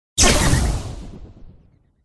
griphon buff, pets; pet grifon

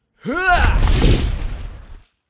mage male attack voice bottom

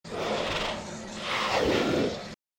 Tiger Stun

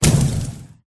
buff in; boss elf

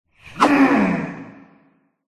mage boss attack voice

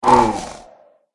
snowman wound

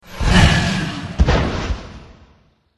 dragon boss death

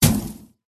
cat attack contact

